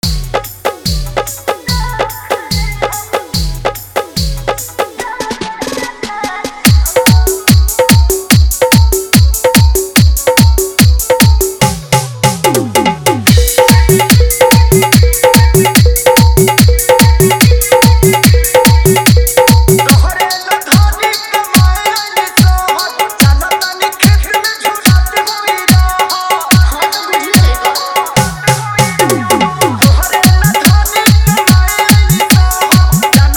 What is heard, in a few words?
Bhojpuri Dhollki Mix, Remix Song